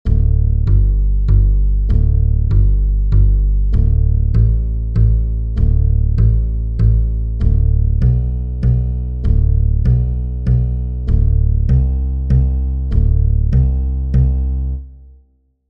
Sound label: Bassic Praise Lead; music corner challenge